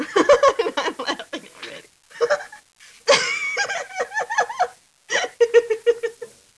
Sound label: laugh; women